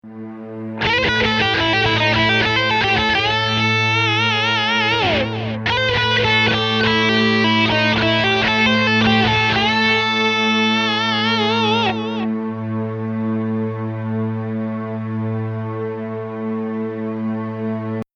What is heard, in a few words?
Lick